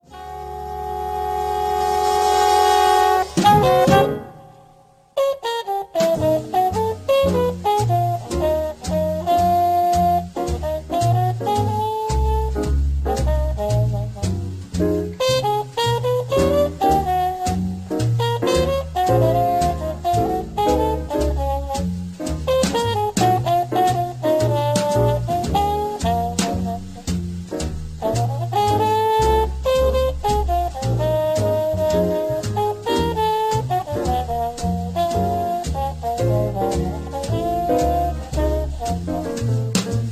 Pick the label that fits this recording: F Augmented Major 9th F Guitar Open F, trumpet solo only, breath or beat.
trumpet solo only